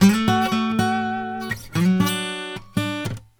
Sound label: Blues Guitar Samples; ILIO; Fretworks; LICK